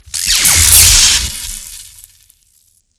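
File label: destroy transformer large explosion; Explosions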